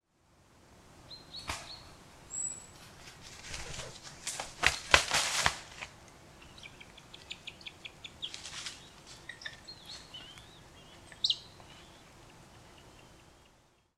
European Honey Buzzard; سارگپه جنگلی

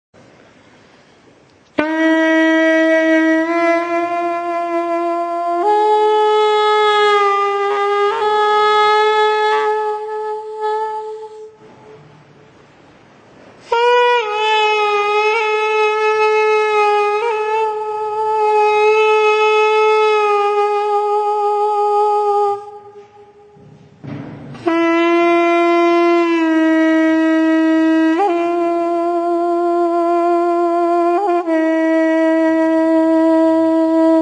Shakh Nafir, ساز شاخ نفیر

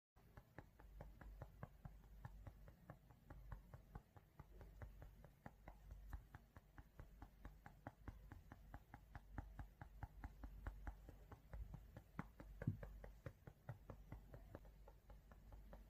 Beauty blender

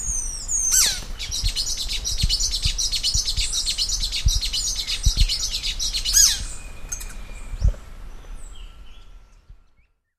Magpie tanager

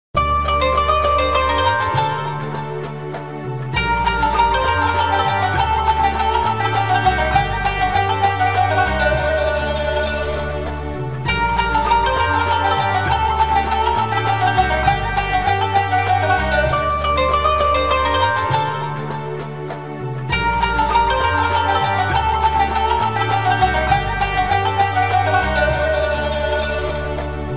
religious ringtone

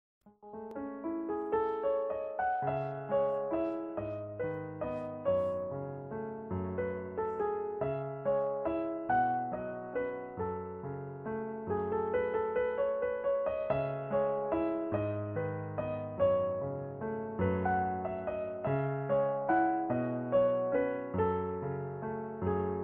Piano; پیانو نوازی میم مثل مادر

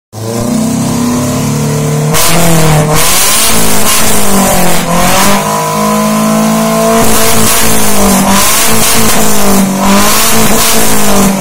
suzuki carry

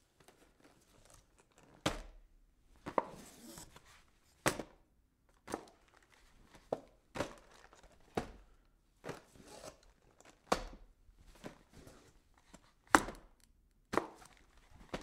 packing

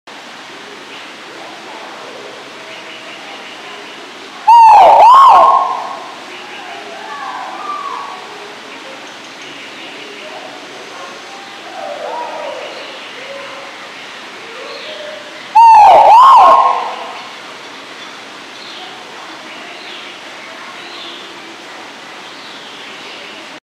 Great argus; پرنده آرگوس بزرگ

